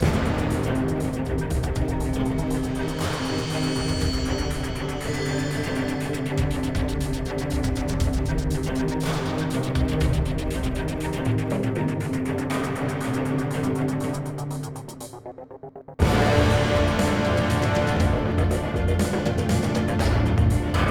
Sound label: Aircraft - Hangar; Injustice Gods Among Us; Ferris